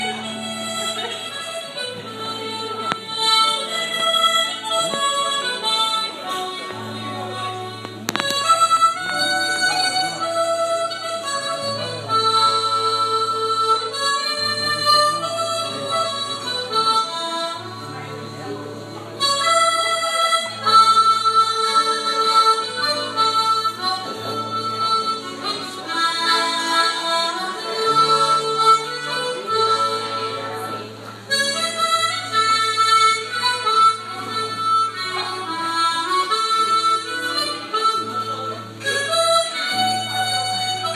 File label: Music